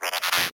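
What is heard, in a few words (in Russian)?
мультяшный звук как мишка ест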